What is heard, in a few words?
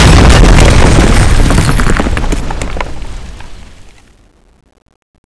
bunker buster explosion after